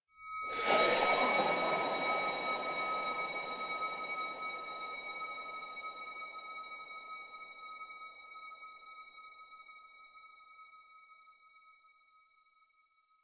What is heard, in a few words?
SFX Kuva Grab; Warframe; The War Within; Mountain Pass Return Peak; Cinematics